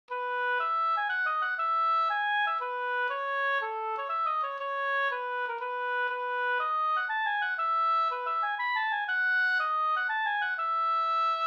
polska brudpolska; Ulfhilds brudpolska av Lennart Bergstrom

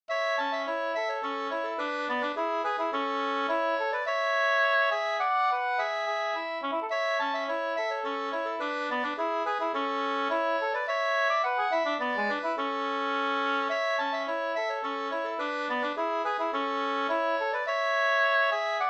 slängpolska

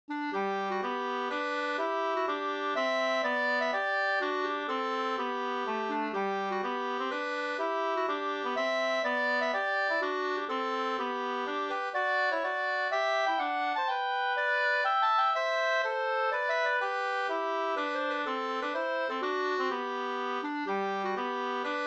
polska, 2st; E Hartwig Gs NSG659

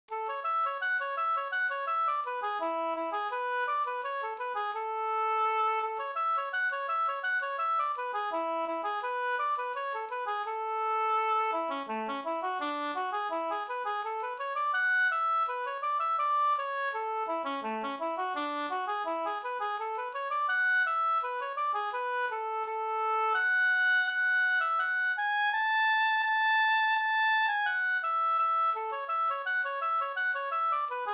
snoa

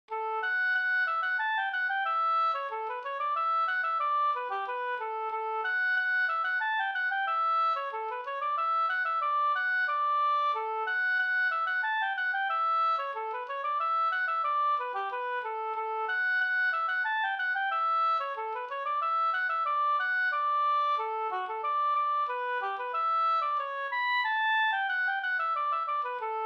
snoa

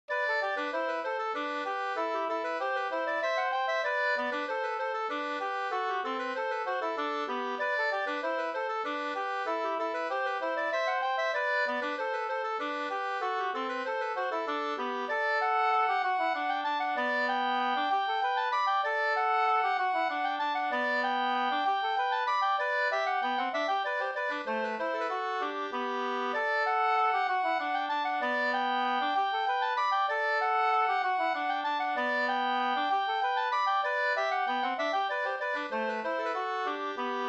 slängpolska

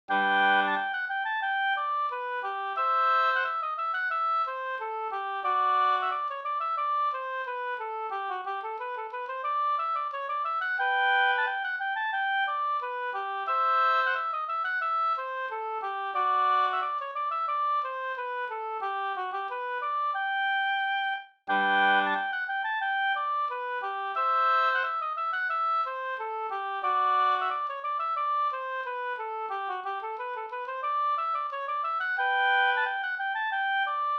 ganglat; e Spel-Jonte Hs

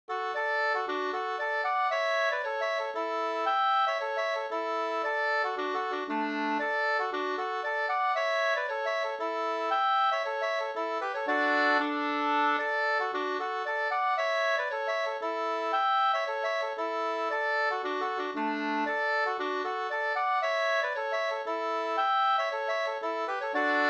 polska; Mellberg SvL Mp254

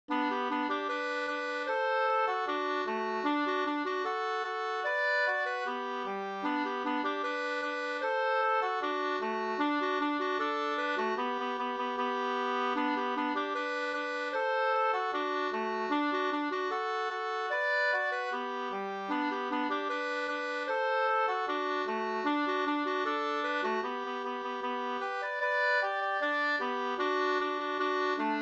polka, 2st; Axevallapolka Vg

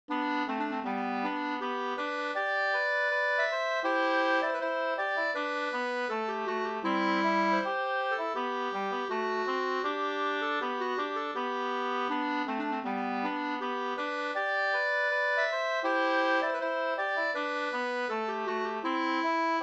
brudmarsch G dur; Osterakers brudmarsch

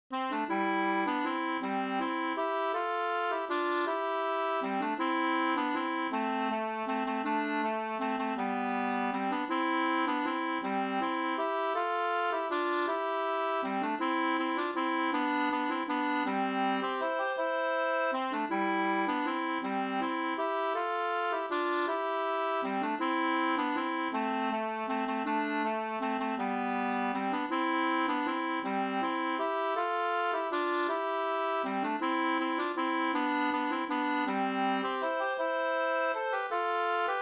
vals C dur

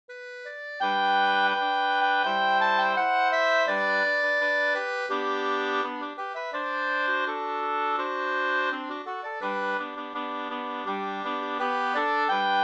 brudmarsch; e NA Oberg

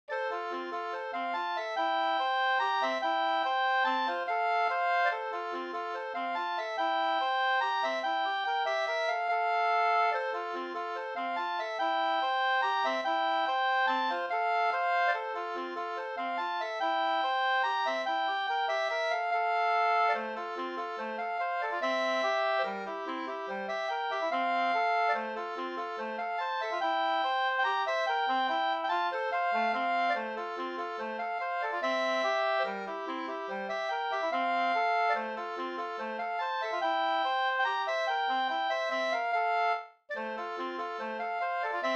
mazurka, 2st

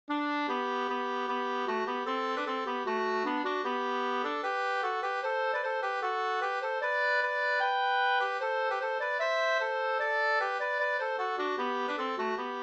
reinlender fr Norge

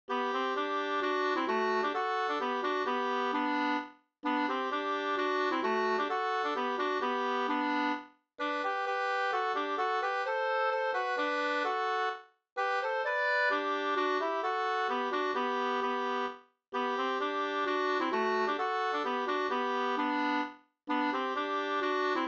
vismelodi Sanglek

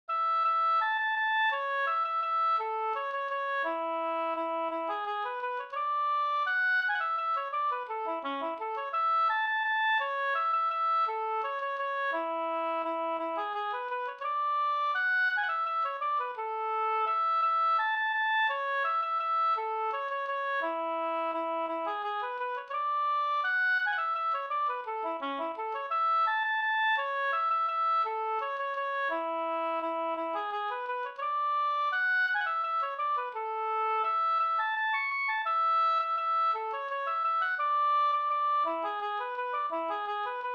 vals A-dur

